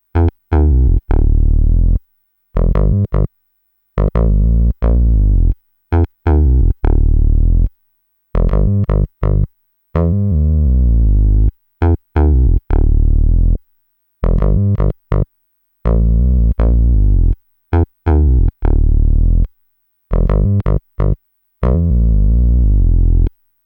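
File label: Saw Bass flanger; uno synth pro desktop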